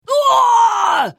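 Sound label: Hit, Khajiit female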